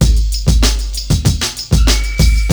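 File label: KETTLE LOOP, BREAKBEATS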